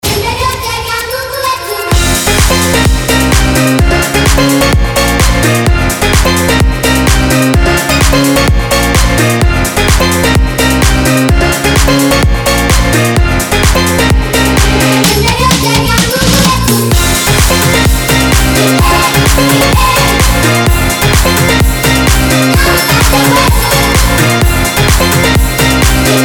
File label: afro dance, ringtone